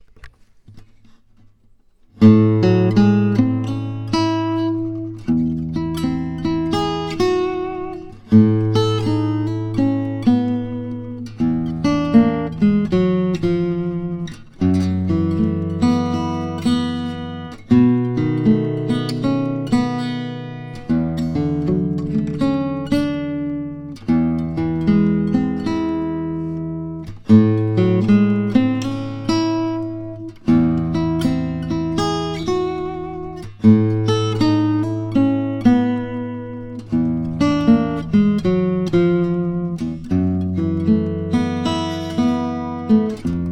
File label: chord study; tailpieces di mauro style